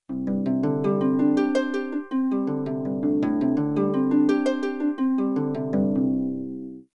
harp arpeg; Dragon Riders; Chronicles of Pern; Dreamcast

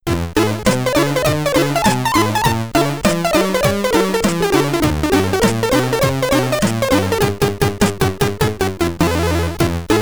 Bonus Round SID Stereo; Trivia Ultimate Challenge; Commodore 64

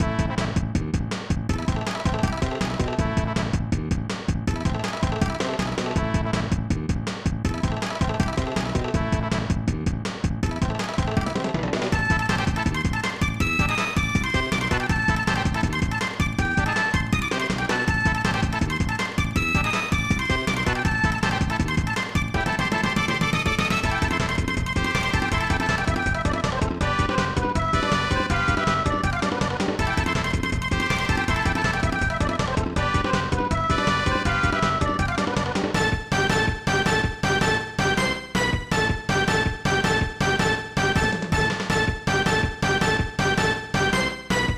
Fight! - Swamp; Shonen Ninja Sasuke

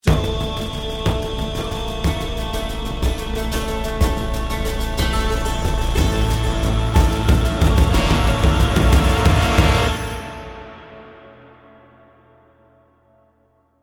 bomb ten sec count

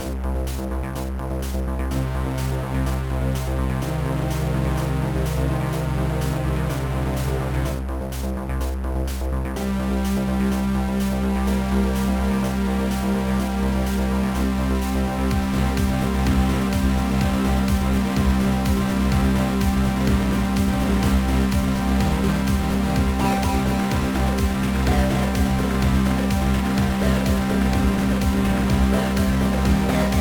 Battle; Spider-Man 2; GBA; Puma

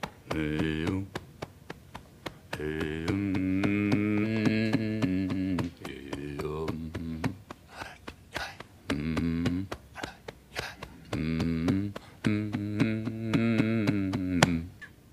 Hum; Bauss

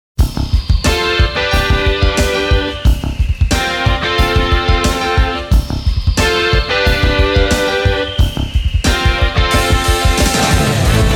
Intense Fighting; Shin Sangokumusou; kyukyoku ongen; Cloud

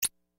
Highpitched Compute

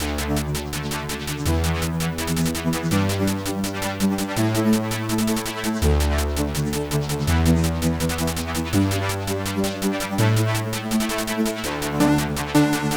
Instrumental; Applecore 2; April Fools

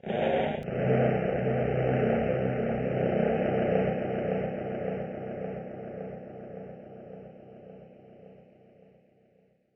Danger Tonal; Insanely Twisted Shadow Planet

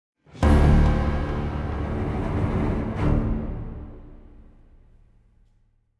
Combat Room Intro Stinger; wellspring

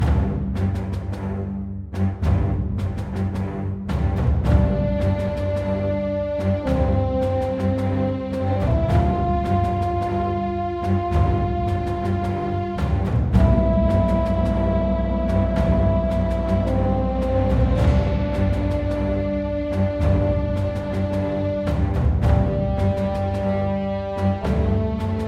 Transition; Dungeon Defenders II; PS4

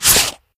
Browse Leagues; Angry Birds

